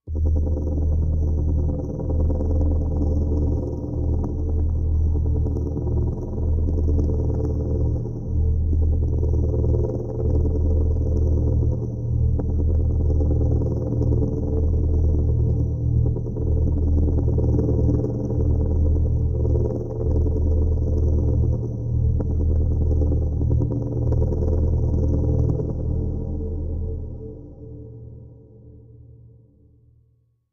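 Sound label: Stationary Motion Vibration, pulsing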